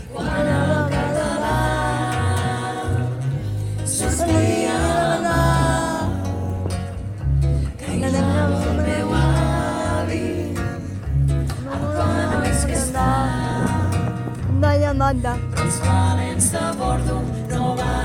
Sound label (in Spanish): CORO